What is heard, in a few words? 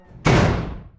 fall; Apotheorasis